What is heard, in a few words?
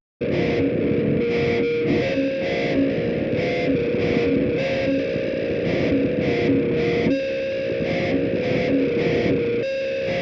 guitar